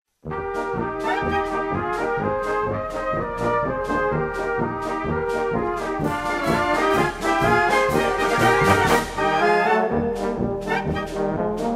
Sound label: polka